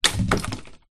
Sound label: stick small branch fall hard ground; foley